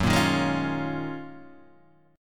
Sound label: D sharp Minor 11th F sharp, chord